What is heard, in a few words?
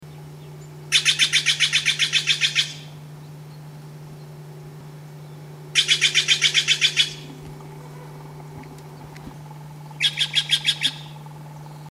Stellers Jay Call